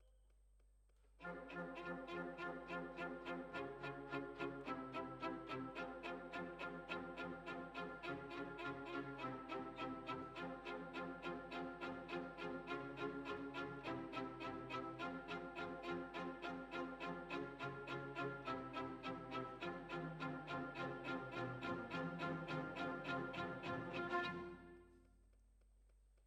Violins, jazz for piano

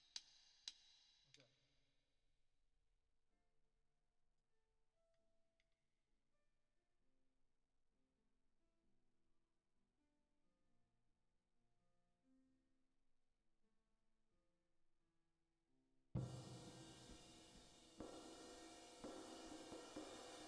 Drums Verb

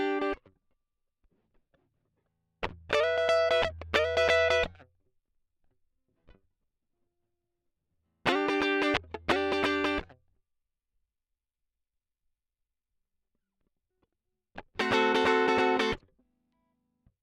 Guit Solo Line, RNR for piano

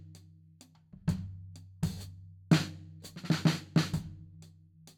Tom

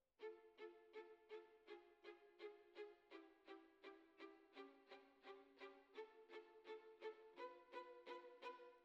Violins Over

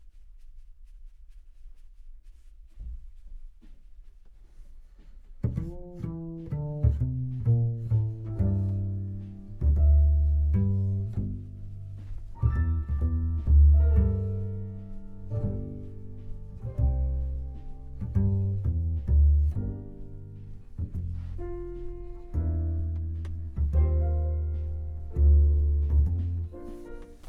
Bass Bot

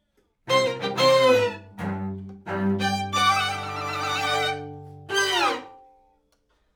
Main Strings

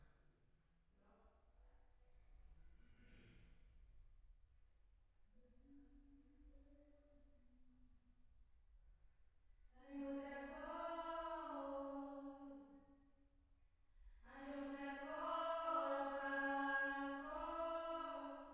Choir

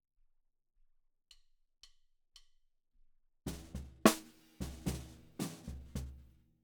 Snare Bot

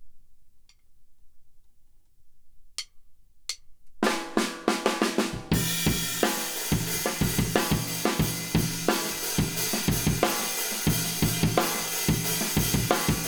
Kit

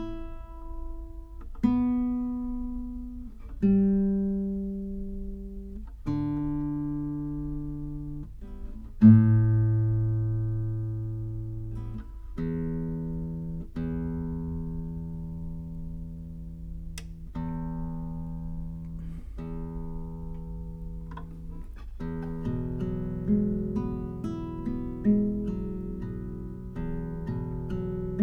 Tape GTR

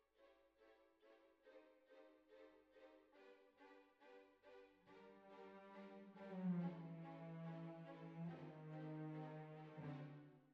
Cbasses

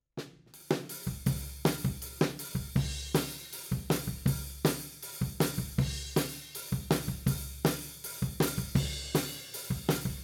Floor, 160 BPM

